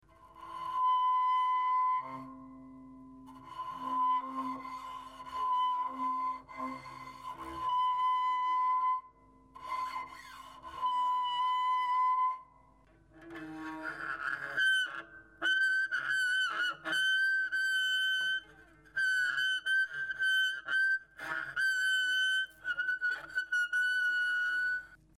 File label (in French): archet larsen, harpe; touchers de la harpe